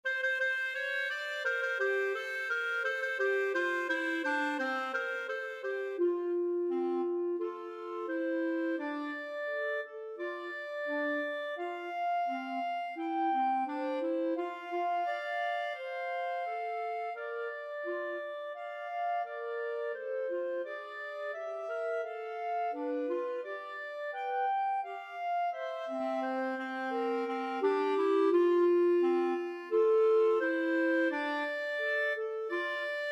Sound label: clarinet duet